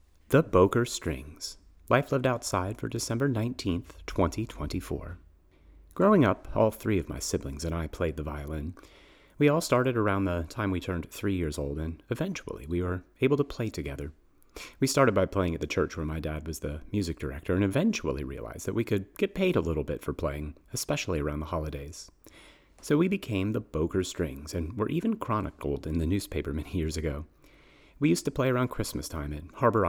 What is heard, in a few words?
Bokor Strings; The Bokor Strings; Kerby's Nursery; Kerby's Quartet